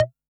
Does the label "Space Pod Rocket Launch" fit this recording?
No